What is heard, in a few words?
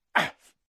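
moan, male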